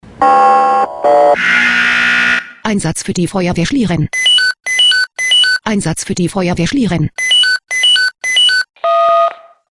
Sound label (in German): SMS Alarmierungstone; FW Schlieren